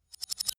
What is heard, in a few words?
thin metal vibrate reversed